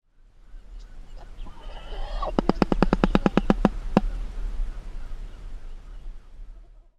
shoebill sound

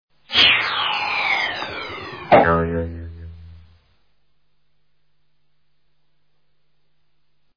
cartoon archery comedy arrow landing

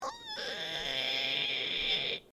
screech held back

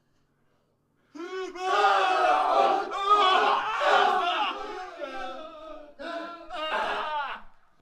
people crying from behind a wall

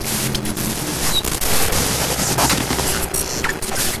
pac man 256 glitch

